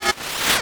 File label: Sci-Fi Electronic Screen Shutdown; Science Fiction